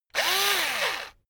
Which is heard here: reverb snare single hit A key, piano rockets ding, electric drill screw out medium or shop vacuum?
electric drill screw out medium